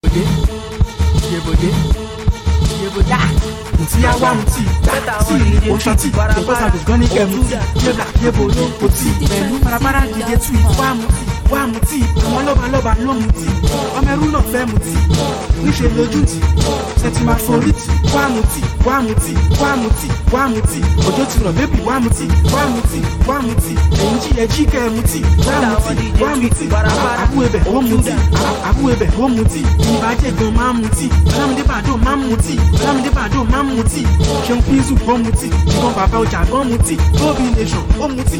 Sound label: Beat